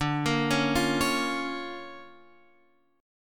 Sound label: D Minor Major 7th Sharp 5th, D